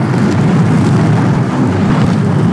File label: roll wood, terrains; Tony Hawk's Project 8; XBOX